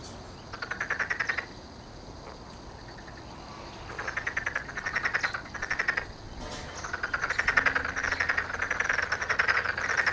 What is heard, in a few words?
frog tokyo daruma gaeru